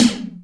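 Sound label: Hi Phase, Alesis D4 drum machine tom; 300 Drum Machines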